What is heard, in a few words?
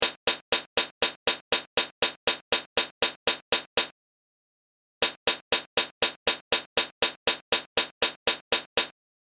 hihat high end